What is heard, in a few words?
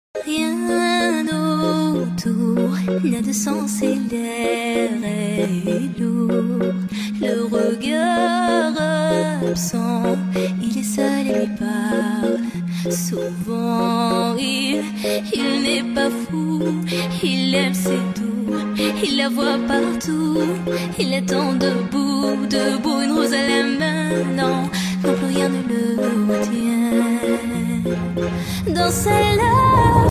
ringtone, english